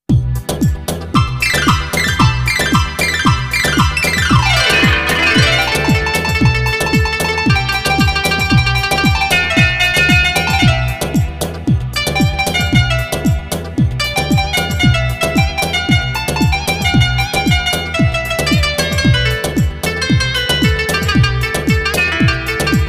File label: KANUN; Telli